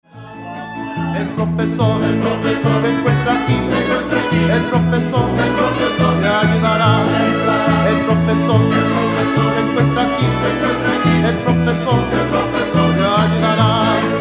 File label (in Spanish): mariachi